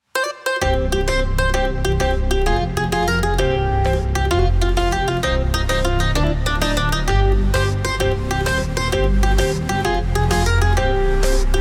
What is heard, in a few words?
neapolitan frenzy tarantella napoletana background house music